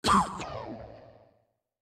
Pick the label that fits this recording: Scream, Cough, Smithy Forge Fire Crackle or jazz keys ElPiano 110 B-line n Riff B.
Cough